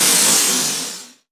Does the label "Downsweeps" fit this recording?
Yes